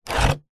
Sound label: industrial empty plastic tool box pick up from concrete; zapsplat